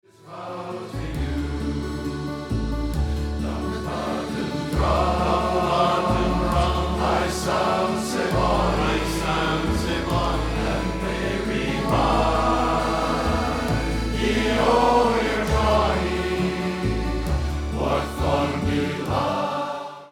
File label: dumbarton drums